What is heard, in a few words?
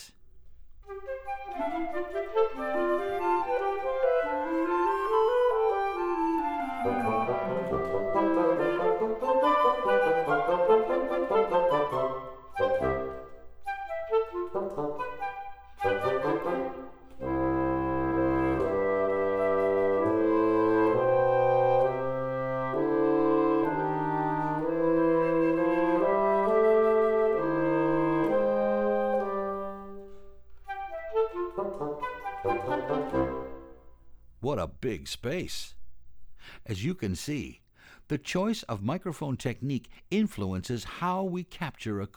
Three Spaced Omnis